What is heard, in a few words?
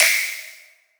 normal hitwhistle; osu; Taiko